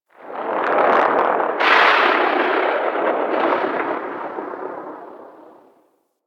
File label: Ext Distant Air Rip, Gas City, Ambience; Warframe; Corpus Gas City